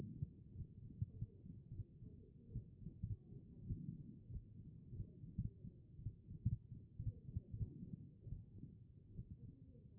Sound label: HEART B MODE